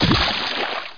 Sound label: splish; heretic ii demo